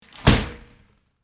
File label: Shower door plexiglass close